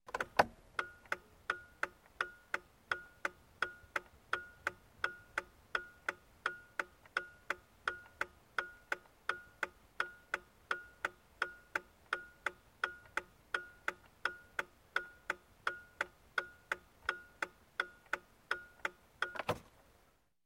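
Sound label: car turn signal sounds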